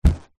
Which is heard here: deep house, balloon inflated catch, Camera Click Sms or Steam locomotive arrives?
balloon inflated catch